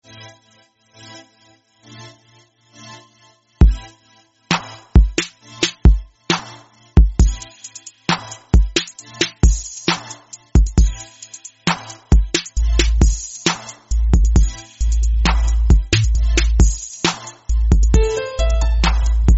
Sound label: trap ringtone